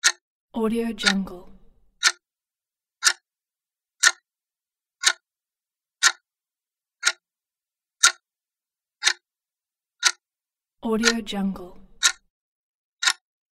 clock ticking

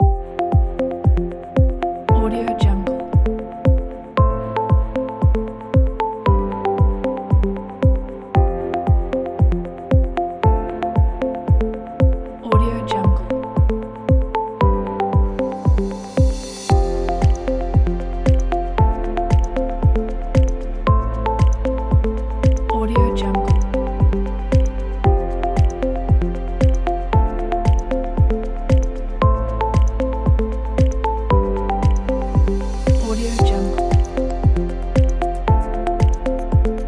corporate science technology documentary

